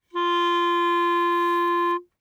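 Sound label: Eb Clarinet fortissimo F4; Woodwinds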